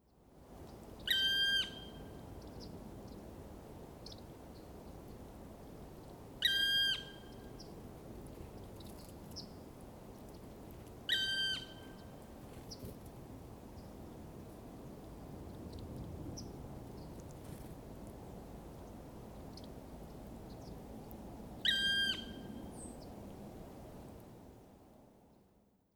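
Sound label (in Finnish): palokärki huuto; linnut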